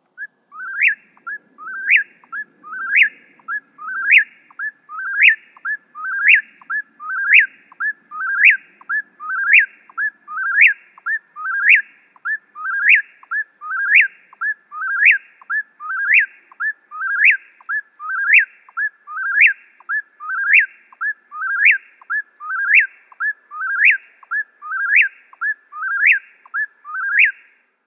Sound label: Eastern whip poor will call